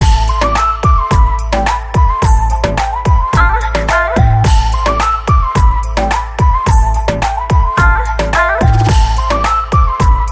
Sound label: kali flute ringtone